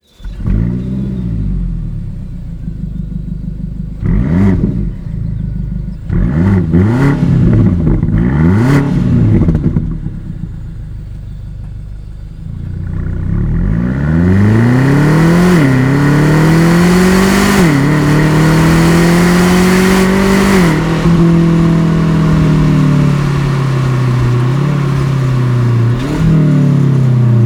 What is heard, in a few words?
Slip-On Line BMW XM; g09